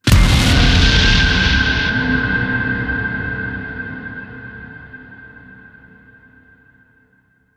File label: final activation; Titanfall 2